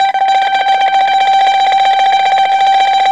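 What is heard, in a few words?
EL BZ TREM G, bouzouki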